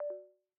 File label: Low Battery; Samsung Galaxy-Tab-A-7.0-SM-T280